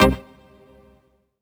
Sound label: Synth Shot C